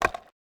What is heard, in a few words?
skateboard land light; smite; loki